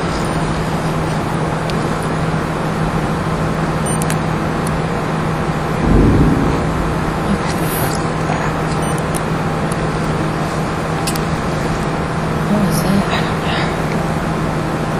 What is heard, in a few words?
Unexplained noise AMPLIFIED